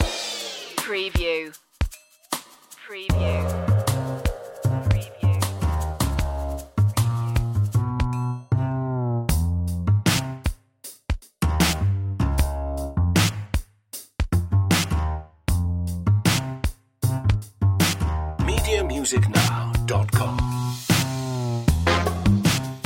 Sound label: dramedy street